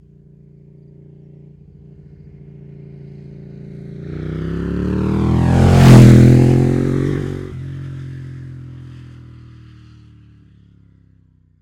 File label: ducati panigale follow by; sonniss gdc